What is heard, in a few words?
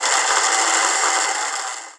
Mapper Pyramid Open; Playable Tutorial Level